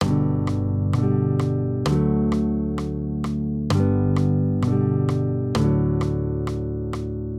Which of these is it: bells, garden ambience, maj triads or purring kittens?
maj triads